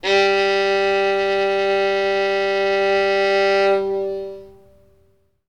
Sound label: refpitch fiddle g